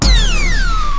weapons

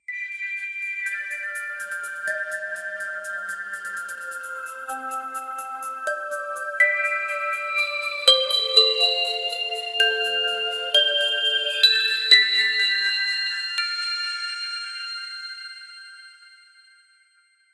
anime

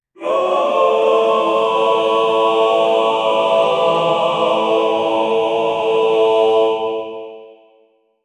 gregorian choir in hell

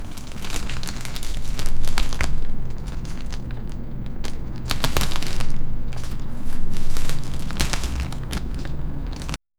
leather clothing sounds

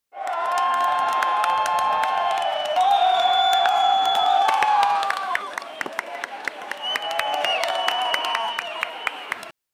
the sound of a baseball

